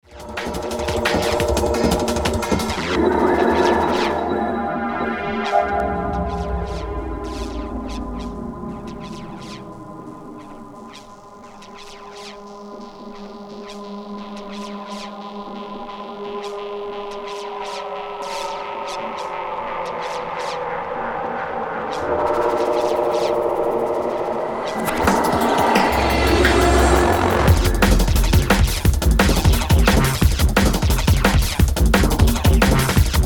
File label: Underwater Lazers